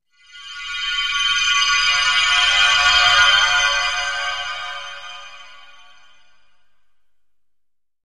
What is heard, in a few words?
Music Eerie Twkl Pad